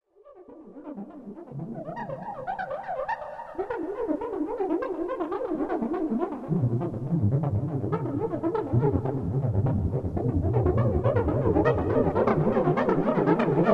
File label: D-50 alien cam